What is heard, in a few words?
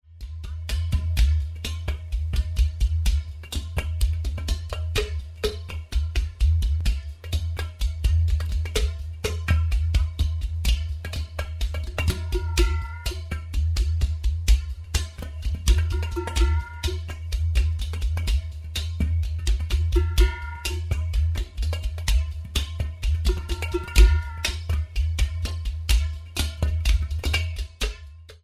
waterdrum